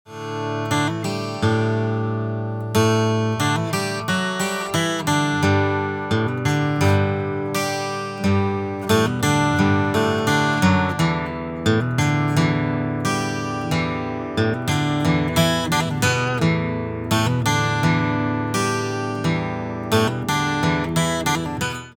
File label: 12-String; LCT-340